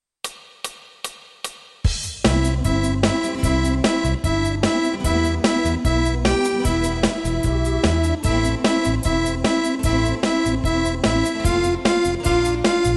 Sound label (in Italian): Fisa tutti